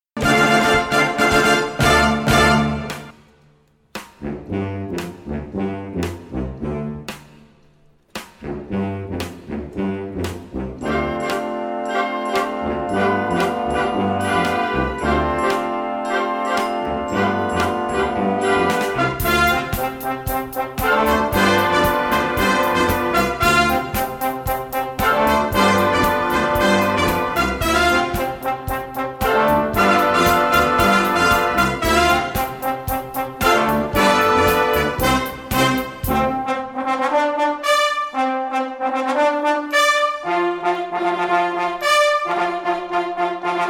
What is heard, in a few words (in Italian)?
Per banda; funk; Simbarrangements